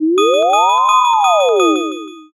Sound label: firetruck